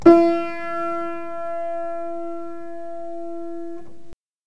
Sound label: Piano